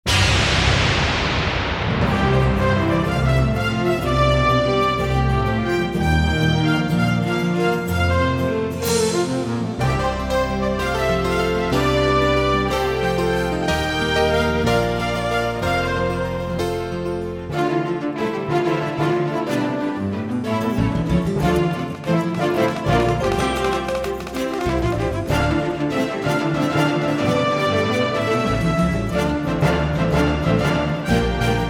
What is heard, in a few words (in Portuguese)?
versao instrumental